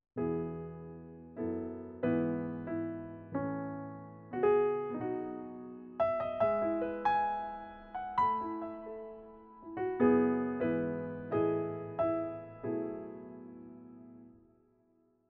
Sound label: british